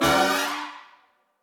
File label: Flashover